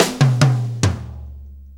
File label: 6-8 Fill, Drum Tools